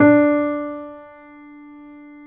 MF D4, GRAND PNO

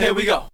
VOX SUNG; AMG Megabass REMIX! VOL-2

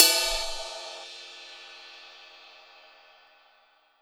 RIDE, House Side Of 2 Step; USB Soundscan vol.51; AKAI

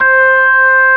ORG. C5; B3 FULL

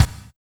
BD, Drum & Bass, 160 BPM; Ueberschall; Drum & Bass Flash Trackz

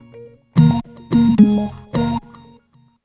Tones; Sonic Foundry; Sony Creative Software; Stylus Pressure Urban Grooves on Digital Wax; Nu Tang

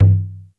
NATIVE DRUM, D110 DRUMS; Crypton - DrumBase5000

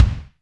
DM5 Kick, Alesis DM5 drum machine

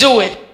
RAVE

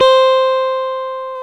GTR, Dual Guitar; Club-50 - Foundations Roland

